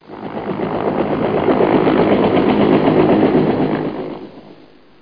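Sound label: helicopter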